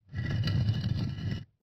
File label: stone door